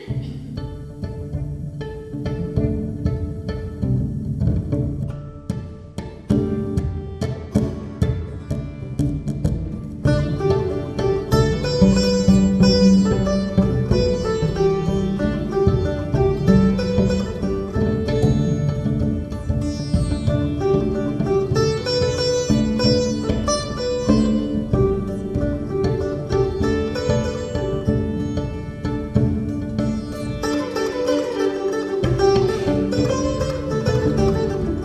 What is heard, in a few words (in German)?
zwischengesang, jazzmesse